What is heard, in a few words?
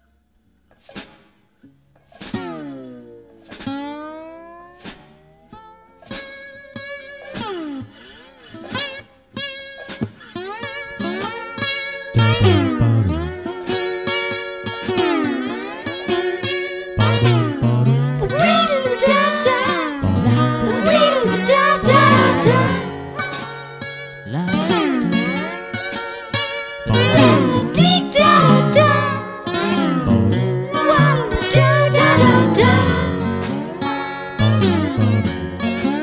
Jazz Ditty; Trilogy; Savyur Records